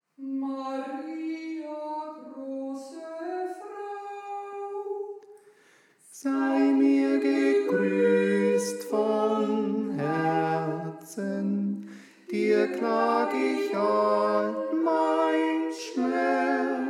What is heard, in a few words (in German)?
Tenor; Maria Große Frau